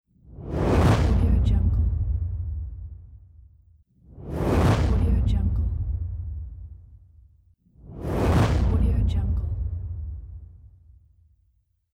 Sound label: transition whoosh with hit and flyby